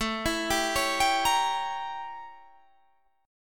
A 7th Flat 9th Guitar Standard; A7b9; arpeggiated; capo=2

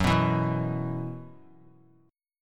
F sharp 7th Suspended 4th; F#7sus4 strummed

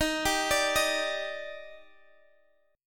D sharp Major 7th, Guitar, Half Step Down

